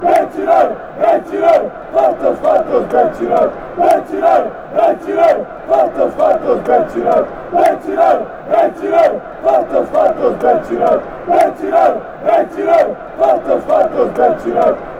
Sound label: montenegro chant